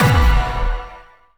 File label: UI Menu UI ONESHOT; Monster Jam Steel Titans